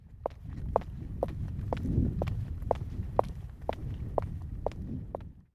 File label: Heels Wind